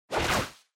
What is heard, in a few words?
Motion Agility Long